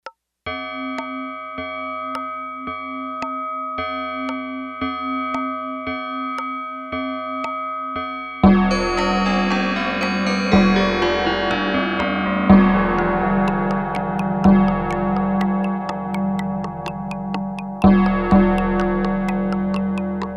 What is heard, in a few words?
Clock Works, Yamaha TX802; Performances; Factory Patches